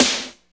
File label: SNR, Tekno Industrial; East West